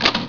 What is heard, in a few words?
Change Weapon; Spectre Supreme Demo